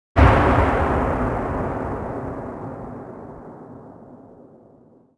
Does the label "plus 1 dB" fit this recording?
No